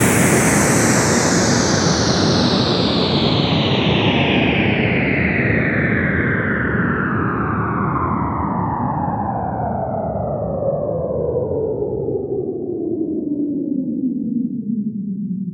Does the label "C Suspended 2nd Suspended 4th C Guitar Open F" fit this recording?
No